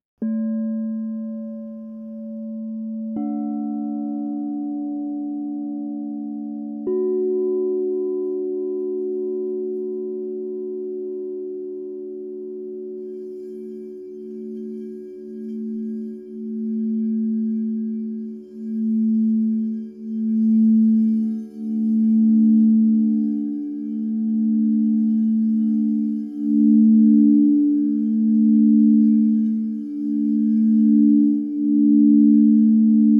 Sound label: set flow freq, singing bowl sets